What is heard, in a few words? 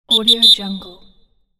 football whistle